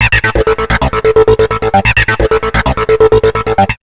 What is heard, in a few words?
HRD 130 SYN, Big Beat; USB Soundscan vol.25; AKAI; HARD 130